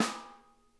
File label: RIM, SNARE; Sonic Reality; Interactive Drum Kits